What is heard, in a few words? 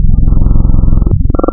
form, wavetables, Classic Synths